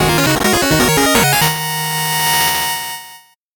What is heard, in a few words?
8bit jingle fast